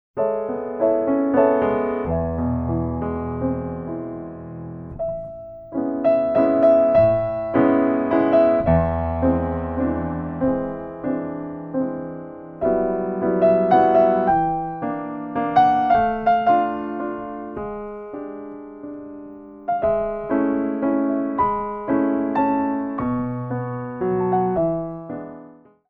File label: stretch; tendu